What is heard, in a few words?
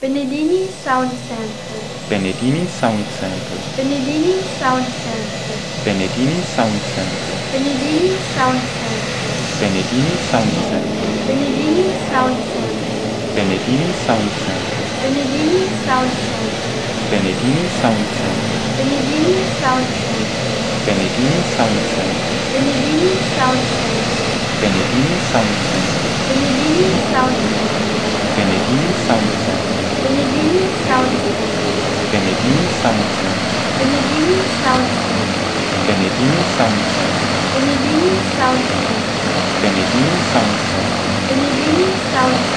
Transall